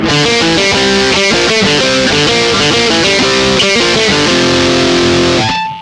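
Les Paul Marshall neck, Neck pickup, Sansamp в режиме Marshall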